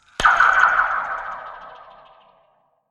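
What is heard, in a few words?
sounds of metal cable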